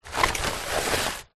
foley paper lots pile scrunched up slide across wood; zapsplat